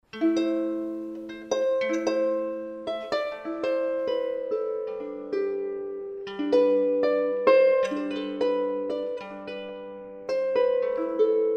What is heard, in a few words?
Celtic Harp; Ja Nun Hons